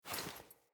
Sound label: Foley Action Default Block